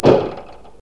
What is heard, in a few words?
Datsun